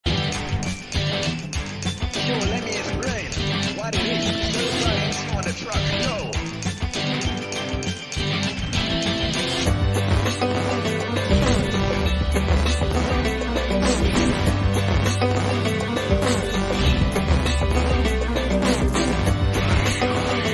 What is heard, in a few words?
HIP HOP